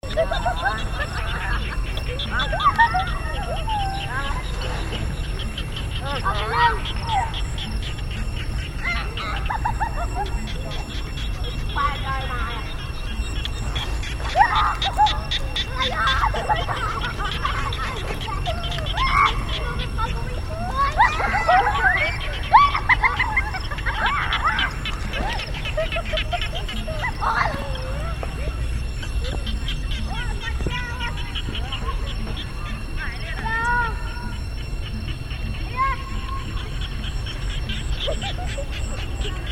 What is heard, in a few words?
angkor